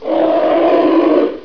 fr pain, friendly

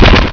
fire; portal house; povloader

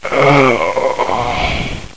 die

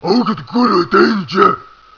zombie alert; zombie soldier

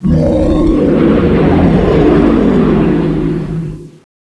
grab tube; tubequeen

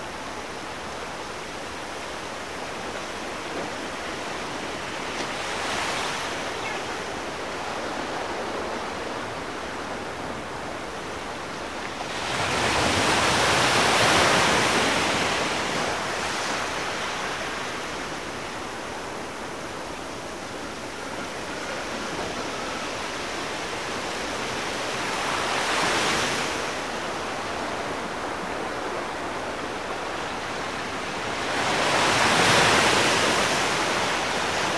beach

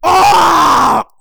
death; sven; crimson commando

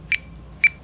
beep key

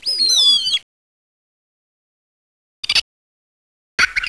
rat, ambience

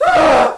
scream frightened

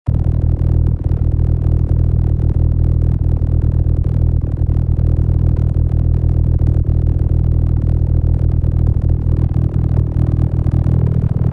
Alien Com; Resurgence

